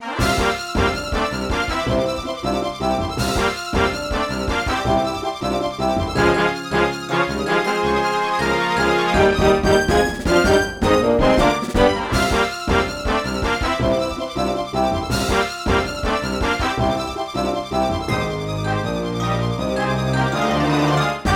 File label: Mini-Game Menu; New Super Mario Brothers